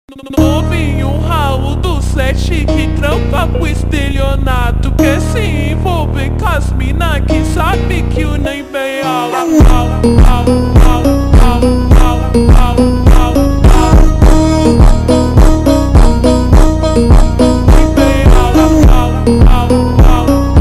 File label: Mambo Super Slowed